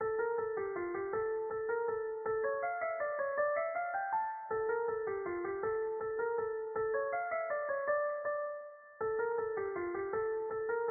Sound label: Mazurka